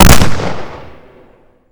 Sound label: shotgun dbl fire; Remington; HL2-Nerv